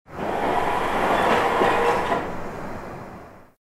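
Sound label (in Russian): метро переключение стрелки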